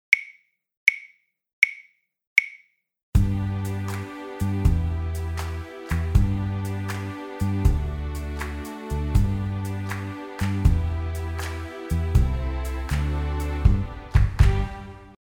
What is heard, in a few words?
Backing Track